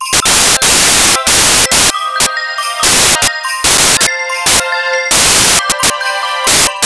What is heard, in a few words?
fantasy, bgm; pictcode